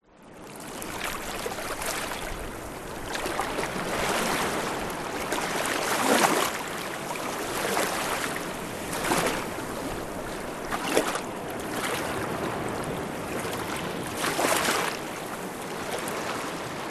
nature rock pools close up small waves distant surf